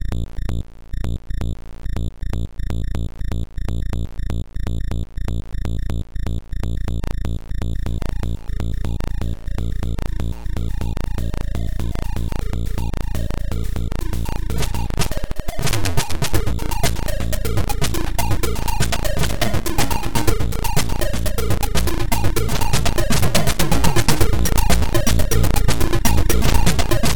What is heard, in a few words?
turbo sound